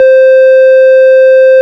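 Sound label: ORG, Farfisa organ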